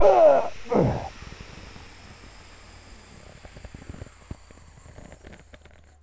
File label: death; sven; player; cleansuit